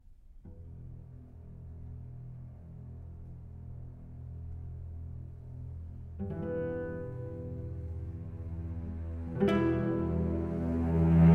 Cello Concerto; Cello Concerto Op 85 in E Minor II Lento Allegro molto; BBC

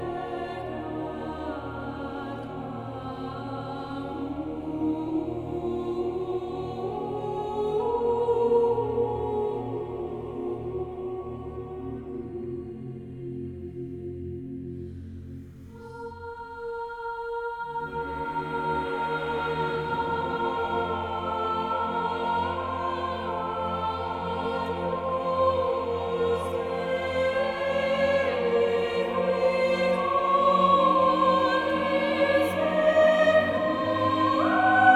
Vocal Version of Adagio for Strings; Agnus Dei

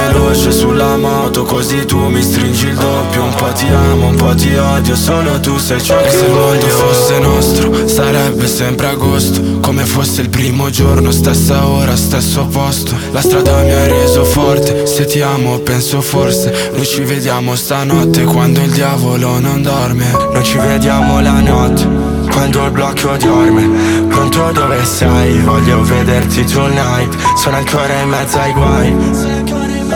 Acoustic

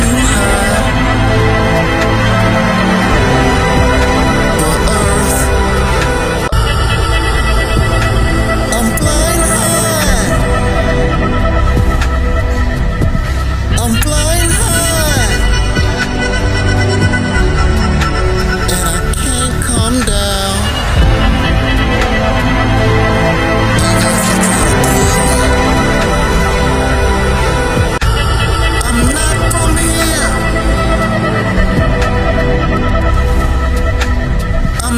Trombone

